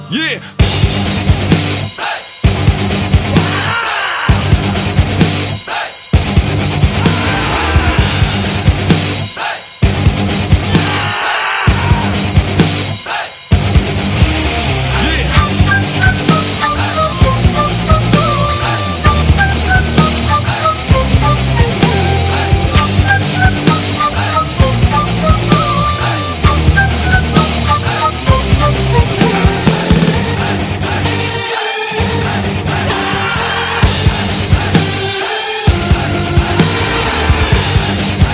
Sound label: Remix Music